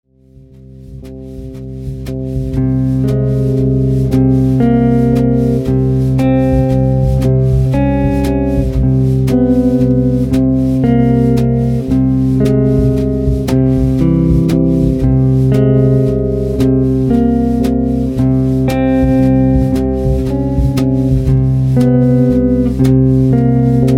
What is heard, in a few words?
Little Waltz